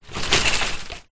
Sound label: transportation bicycle wire basket shake movement